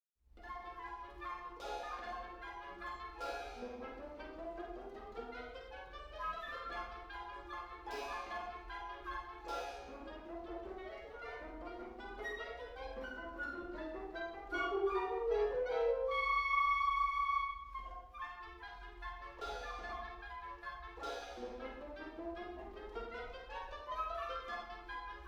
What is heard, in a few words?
Scherzino. Vivo leggiero; Mussorgsky Modest; Pictures at an Exhibition; V. Ballet des poussins dans leurs coques